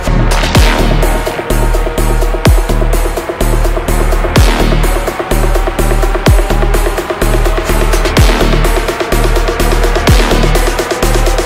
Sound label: intro music assault